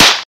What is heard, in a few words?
punch face slap kung fu